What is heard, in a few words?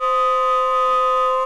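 C5 with C6 with D sharp 6, flute multiphonics; modern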